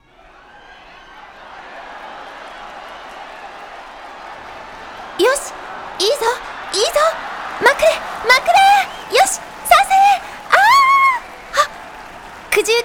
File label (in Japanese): 観客